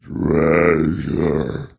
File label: Lost City Groan